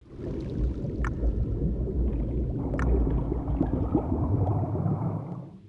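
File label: estestvennij zvuk kapel i zvuk rodnikovoj vodi, natural sound of drops and sound of spring water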